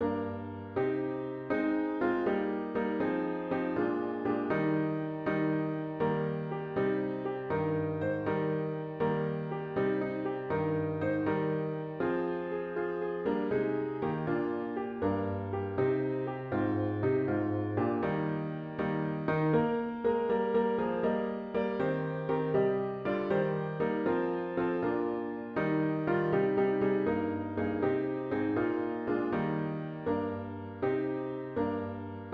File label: Joseph Dearest Joseph Mine piano with intro; worship service